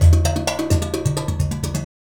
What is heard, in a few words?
FUTUR 130, FX loops